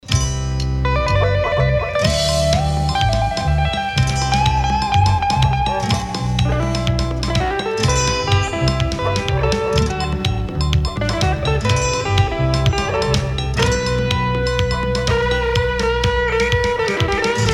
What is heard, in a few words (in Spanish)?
Samba Gitana